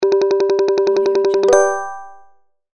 game scoring

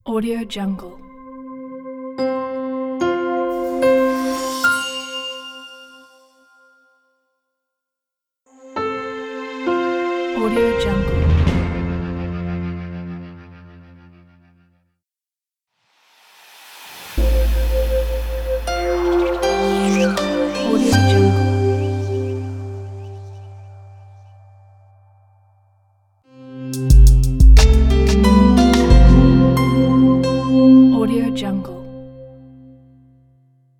various logo pack

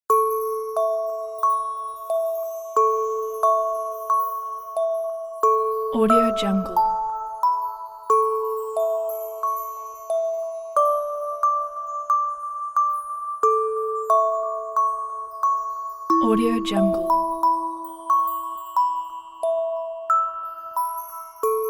music box jingle bells

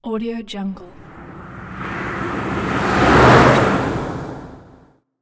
car drive by

